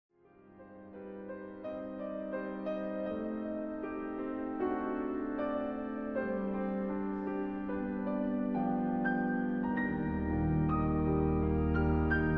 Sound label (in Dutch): psalm 130 instrumentaal; Zingt een psalm deel 2